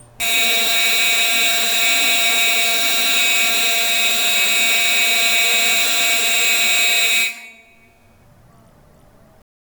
create a strong intense s, financial

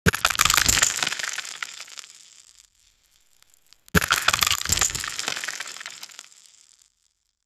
a sound for an electronic, rock

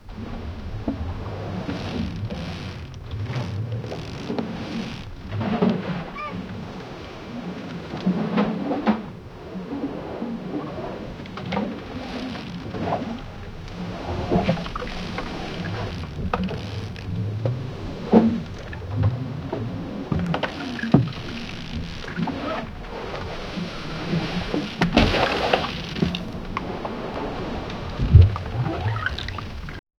realistic sound of findin, oars

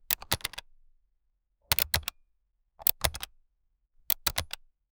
single firm press on atm, keypad

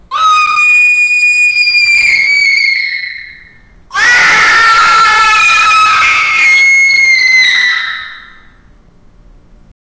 a 10 year old boy screami, trembling voice

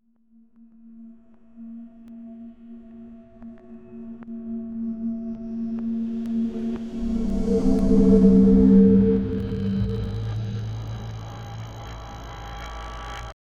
design a layered audio te, ambient drones

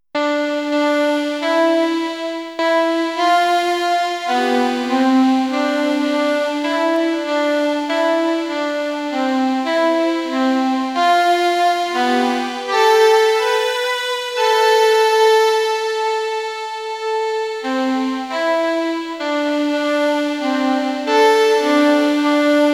background score for a

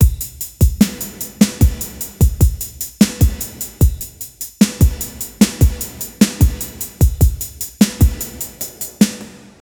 funk

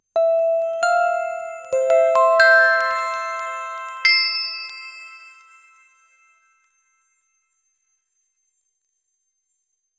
outro jingle

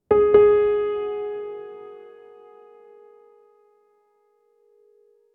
quiet warm system alert, electric piano

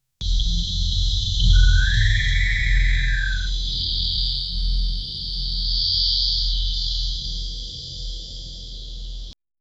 intimate forest clearing, clearing

